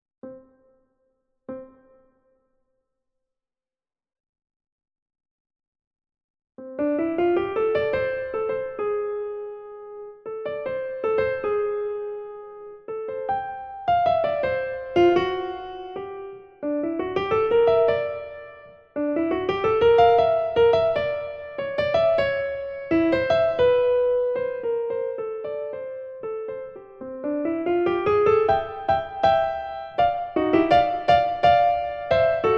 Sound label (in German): Lyrischer Walzer RH schnell; Schostakowitsch